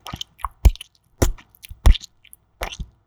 Squelch, messy; Foley; Judge John Hodgman

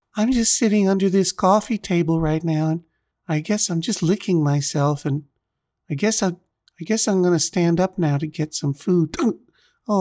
Cat, mimics his cat; Hodgman mimics his cat; Judge John Hodgman

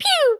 Laser gun; Let's Learn Everything!

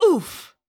Oof; Foley Library; People Sounds; Let's Learn Everything!

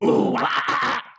"OOH-WAH-AH-AH-AH"; Foley Library; People Sounds; Let's Learn Everything!

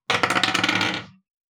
Dice roll; Foley Library; The Adventure Zone

